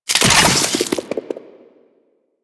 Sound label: Infested Beam Pistol Empty Clip A; Warframe; Weapons